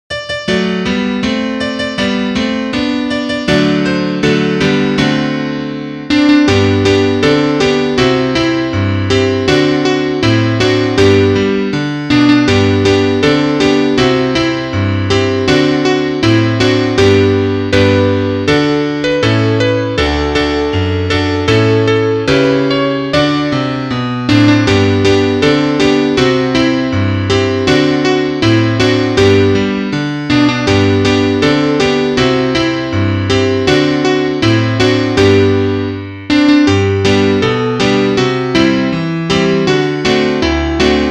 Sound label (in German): Klavier Solo, trompete; Bolles Pfingstausflug